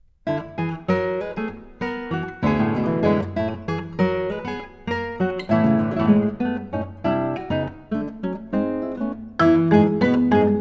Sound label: for 4 guitars & percussion